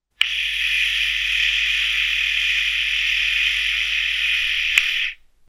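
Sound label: sound of razor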